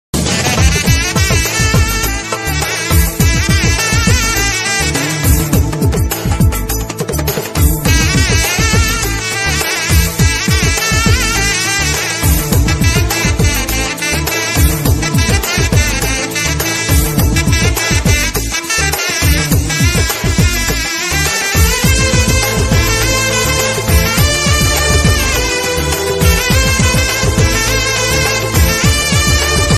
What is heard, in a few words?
bgm ringtone married bgm tamil